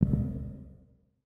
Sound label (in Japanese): 足音; LcdDem SFX